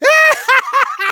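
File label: laugh happy, German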